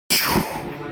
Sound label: mech